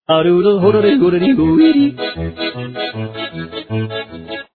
polka german; garfield futbol

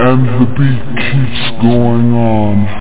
the beat

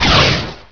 Staff Ready; Heretic II Demo

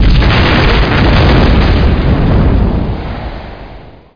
large explosion; SpecOps2

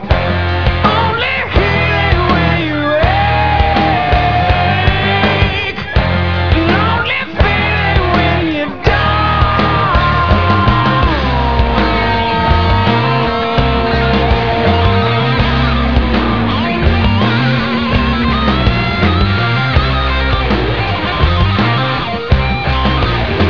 rock

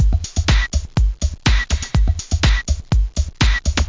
breakbeat; instruments, beats; World of Sound

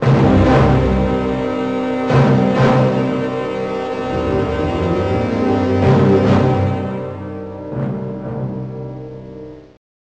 pumping classic; Amiga

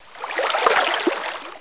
fish mov, monsters; HereticII; Heretic II Demo

